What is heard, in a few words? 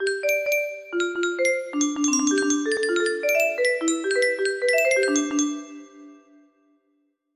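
music box melody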